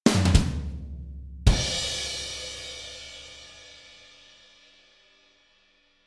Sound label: cut, drum